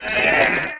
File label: moan; The Elder Scrolls III - Morrowind